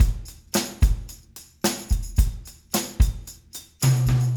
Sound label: 110 CHERIE bt + tam